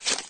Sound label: move item; sfgame